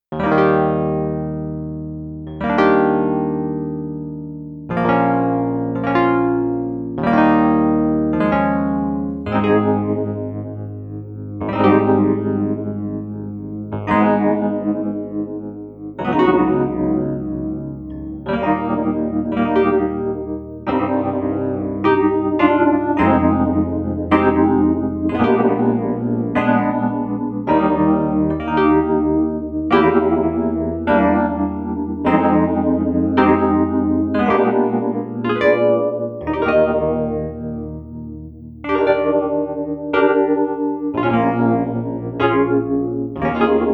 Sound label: 16 Stage Wurlie Vibrato Env Mod LFO Rate; chaos phaser; Chaos Phaser Demo